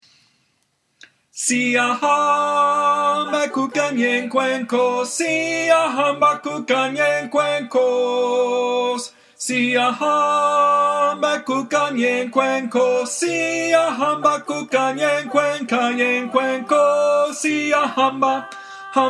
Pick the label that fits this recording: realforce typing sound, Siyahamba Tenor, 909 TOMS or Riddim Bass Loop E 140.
Siyahamba Tenor